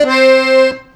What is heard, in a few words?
Accordion OS; Sonic Foundry; Rondo Brothers Trip-Hop Loops & Samples; El Corazon